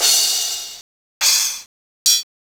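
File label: Cheetah cymbal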